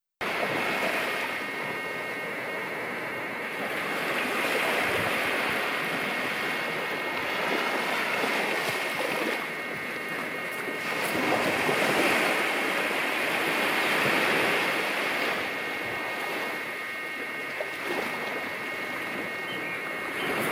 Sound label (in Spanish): Playa Jeremi (Original Video Ton), Curacao